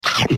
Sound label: eat